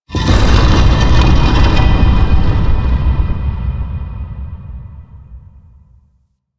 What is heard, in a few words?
Twisted Stone; Warframe; Man In The Wall